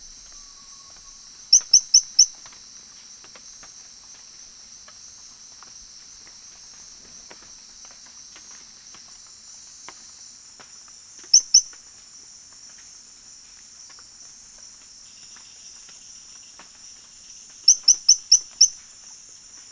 Allobates; Autazes; BR-319

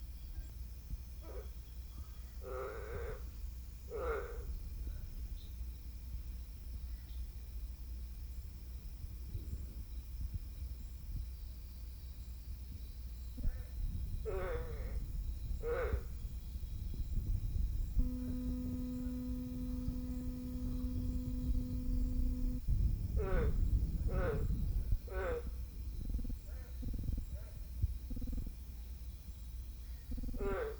Phrynohyas resinifictrix; CENBAM; Res. Ducke

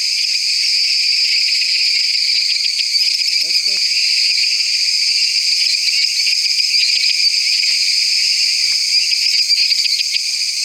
Dendropsophus minutus

